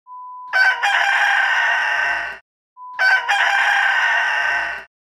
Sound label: Rooster sound